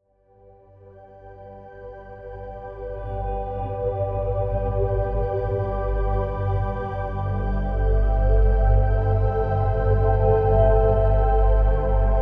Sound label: Ambient